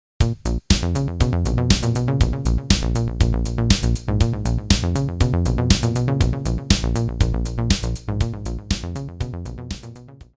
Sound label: delay